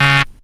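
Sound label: synth tone; SoundFactory; SMPMANIA; EFFECTS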